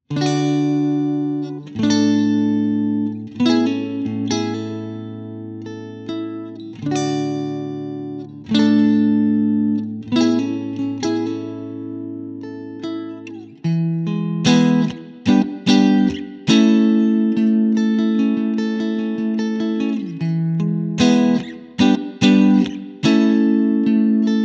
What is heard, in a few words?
clean; jensentone